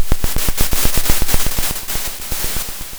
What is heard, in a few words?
Sound of a drum roll